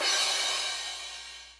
crash, Hardcore Breakbeat; Big Fish Audio; Hardcore Breakbeat Action; Robot Live

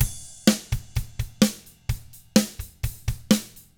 Double Platinum Drums, surf rock; ILIO; Double Platinum Drums 1

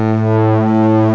SYN STR, CMI Factory

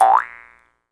Jaw Harps; E-MU Producer Series Vol. 7 – Old World Instruments

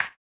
HAMBONE, Vocal Blues Soul

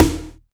Snare, Alesis DM5

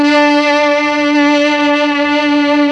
STRING; ILIO; Propeller Island Legendary M400

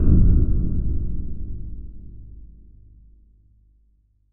Deep Tank C2, FX, Club Techno

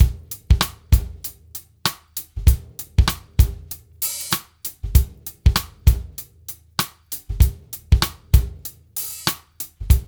DRUMS; East West

